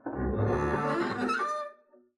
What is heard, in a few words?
arco noise moan pig short, double bass; dsmolken; noises